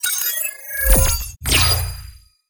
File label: ui boss round team; autochess